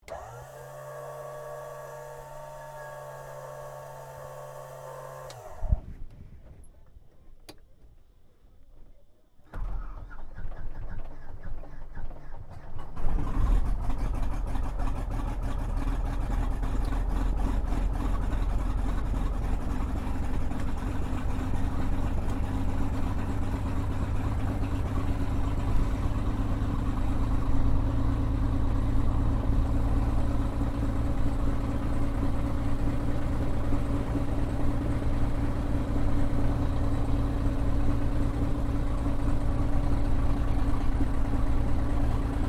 AIRCRAFT CESSNA O-1 BIRDDOG STARTUP INTERIOR, spotter aircraft; Airplanes (Sound Effects:Vehicles)